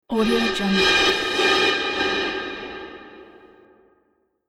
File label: pulsating fear transition